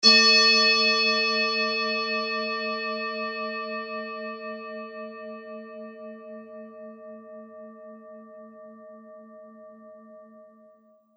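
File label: tibetan bowl, a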